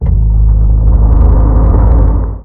engine

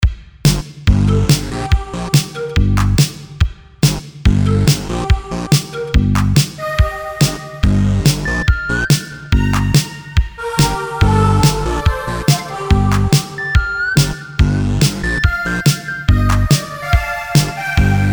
synth wave

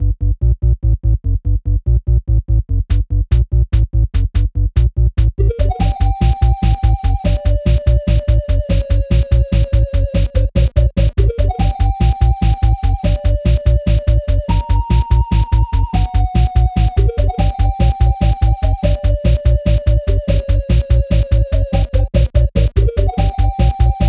spooky

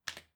keyboard key press; keyboard typing

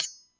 machete vs spatula

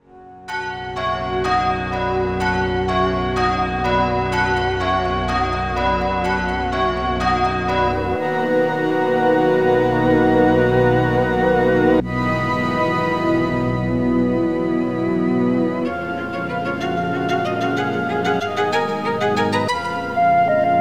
bells o freedom

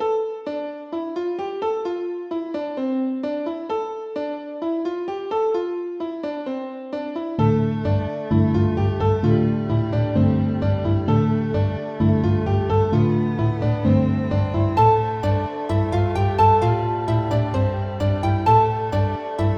rpg style track

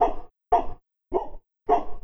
dog barking mono

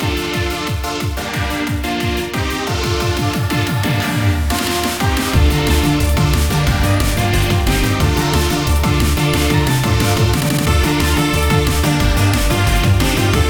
darkness road climax remake bpm180

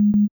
system pause in

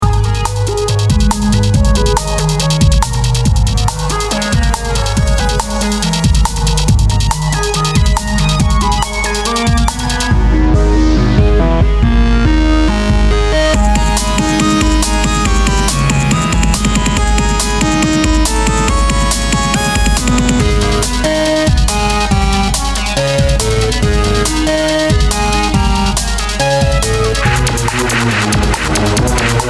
boss battle; star run

